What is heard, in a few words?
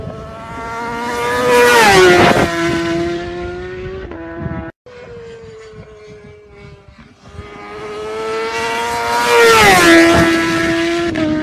moto, doppler sound